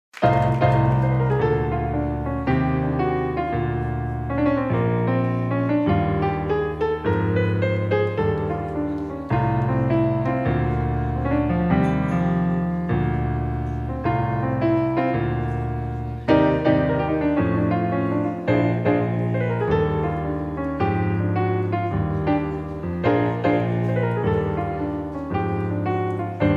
blues

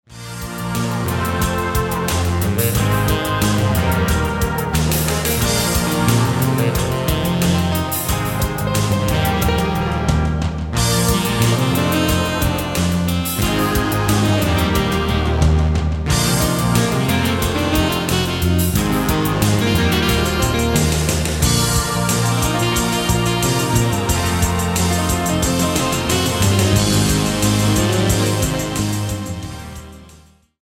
blues

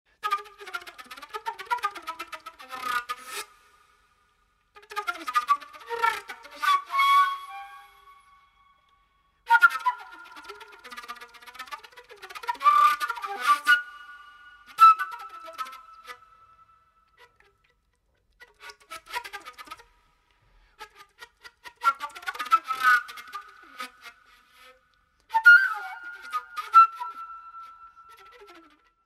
Improvisation; Flute XXI Project

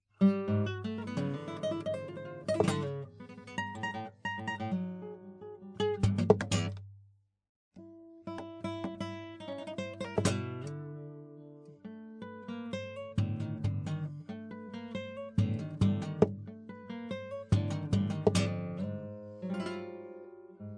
Percussion Study; Arthur Kampela; Chitarra

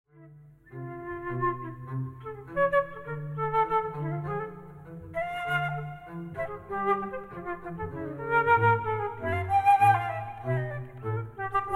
Improvisation

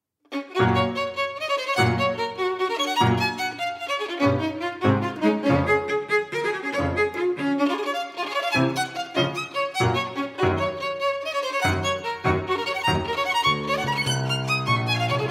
Allegro con Brio-PIANO TRIO N.2 OP. 67; SHOSTAKOVICH

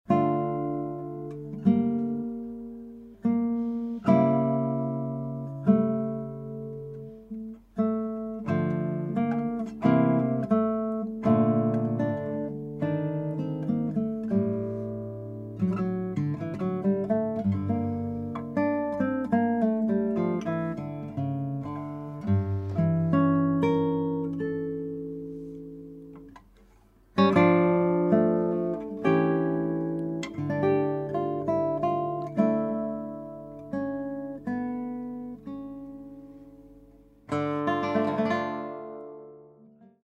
Sarabanda, Partita n. 2 BWV 1004 for violin; Johann Sebastian Bach ( 1685- 1750)

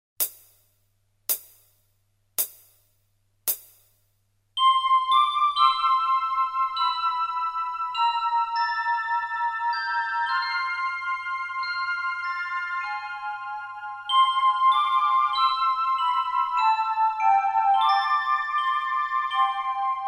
without melody, Basi Musicali

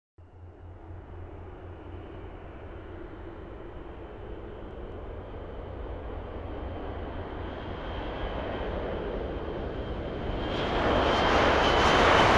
Super jet (with melody)

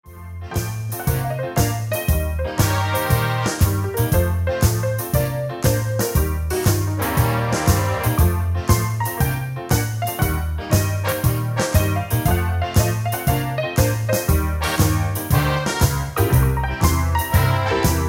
Improvvisation in Honky Tonk

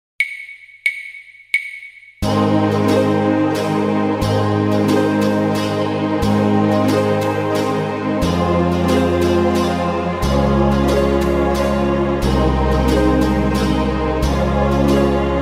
without melody, Basi Musicali

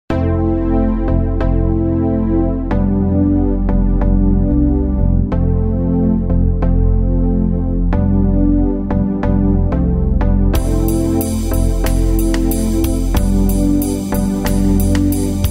with melody, Basi Musicali

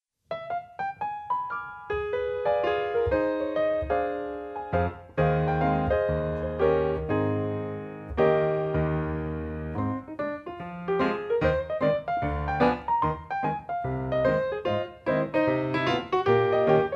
RAGTIME

